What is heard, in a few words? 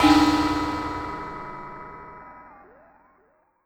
die